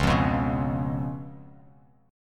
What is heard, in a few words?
C sharp 7th C sharp